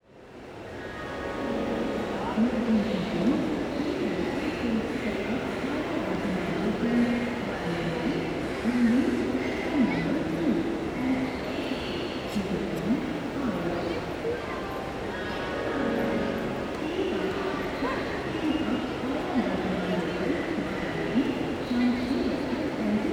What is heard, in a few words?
Train station announcement train horn and crowd away wide, North India; Charlie Atanasyan; Faunethic